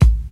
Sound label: good kick sound A key; kick drum samples